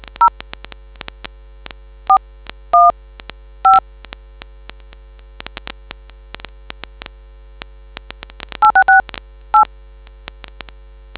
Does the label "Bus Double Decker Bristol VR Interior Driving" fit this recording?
No